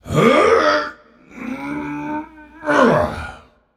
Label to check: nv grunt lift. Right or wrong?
Right